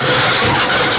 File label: scrape mt